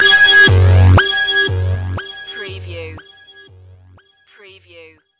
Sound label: Short n Evil